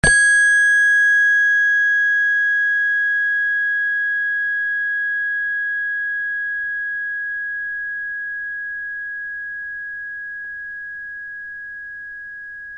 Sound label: space bar